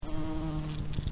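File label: bumblebee, BUZZING BEE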